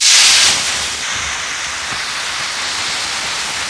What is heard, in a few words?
hiss loop, fire trap; hl2